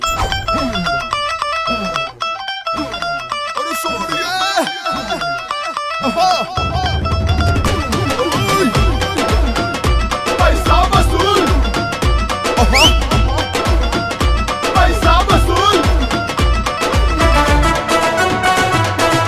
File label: Telugu Ringtone; Paisa Vasool